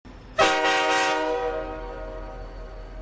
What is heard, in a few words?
Train Horn